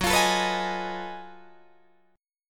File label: Gb Minor Major 13th Gb down Guitar DADGAD